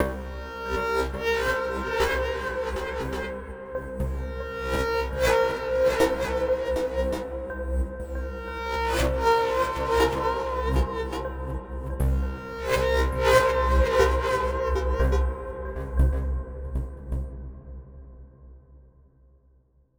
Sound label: Disappointment, Film music